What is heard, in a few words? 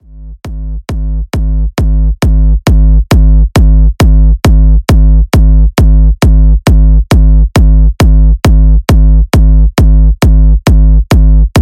signature kicks; zumstein